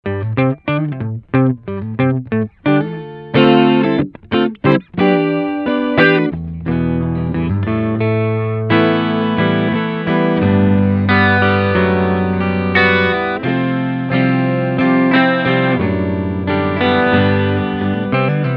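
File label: Front Pickup Lick Chords, guitar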